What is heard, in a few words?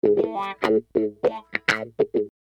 Wah Guitar; Construction Kit; HIP-HOP; Sonic Foundry (Sony Creative Software)